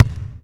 Basketball Bounce

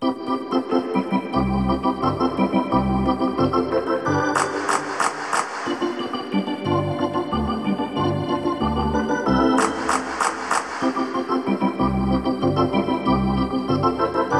Hockey Organ

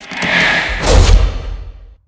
Menu Horror

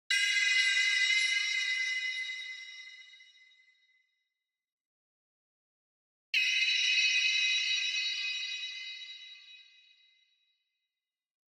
BLING Metal Screw Light Vibrato; boom library; Anime Essentials

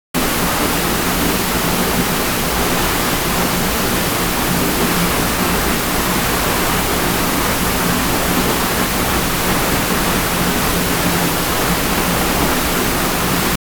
waterfall; best service Blue Box; water streams

